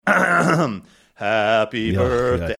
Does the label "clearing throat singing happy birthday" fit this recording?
Yes